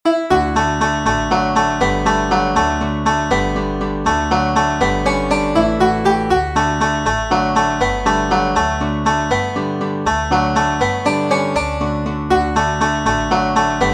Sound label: Traditional jig, Irish banjo